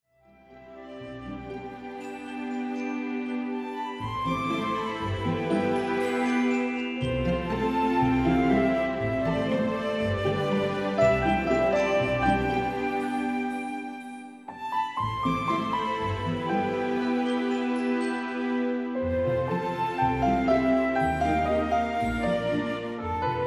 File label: BGM; MLP Friendship is Magic (Mobile Game); My Little Pony OST Repository